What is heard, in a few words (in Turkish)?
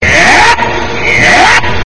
ultra bass ve hizli